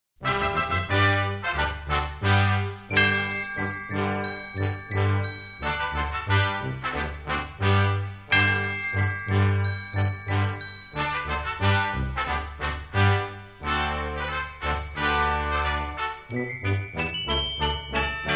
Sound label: Deck The Halls, Brass Band and Small Group